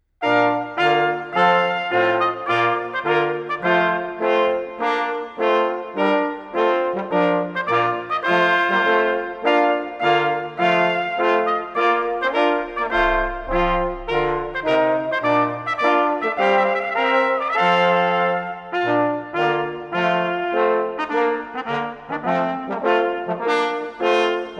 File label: trumpet tune; Purcell